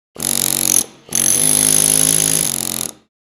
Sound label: Jackhammer drilling short breaks